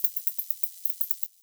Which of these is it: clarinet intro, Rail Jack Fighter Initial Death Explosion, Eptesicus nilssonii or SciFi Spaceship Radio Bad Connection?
Eptesicus nilssonii